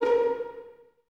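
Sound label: violins A#4 pizzicato, String Ensembles; Miroslav Vitous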